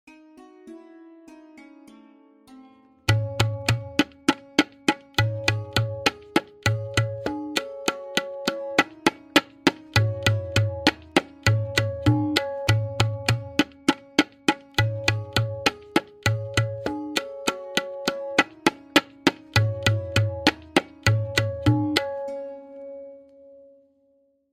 Variation 5-Played with Lehra, Benares Kaida 1, Tintal; Benares Kayda 1